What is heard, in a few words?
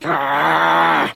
growl, Voice